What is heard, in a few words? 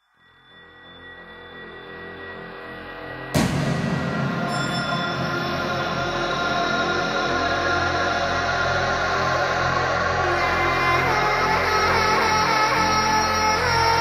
bass club avtozvuk